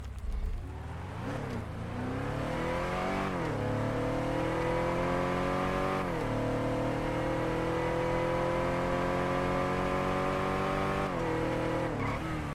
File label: truck v8, vehicle sounds; engine sounds; polcaracara,contender,caracara2; FiveM